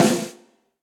Snare Press Bright Room; Snare Articulation; Drum Hits; Session Drums Studio; Standard Hits

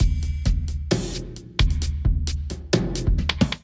Kit groove 132 bpm, Drum; MIDI Clips; Borella Kit groove; Ableton; Factory Packs; Drum Essentials

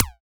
Alien FM Perc Short

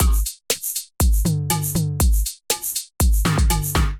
Kit; Floriel Kit; Ableton; Build and Drop

